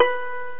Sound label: pluck, net instrument; BrainOpera; net-music; Internet 1996 World Exposition